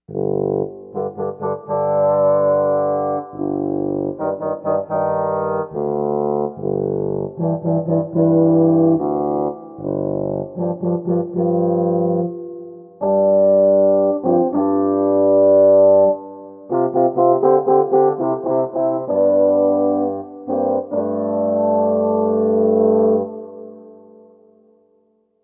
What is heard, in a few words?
French Horn, Korg M1Rex; Factory Patches